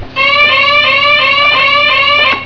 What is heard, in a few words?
Claxon; Noises; nova